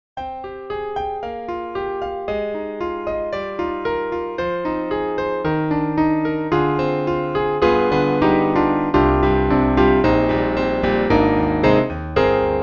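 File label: Broken Piano Upright; SteinboyUpright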